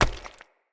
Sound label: Unarmed Punch Impact Rock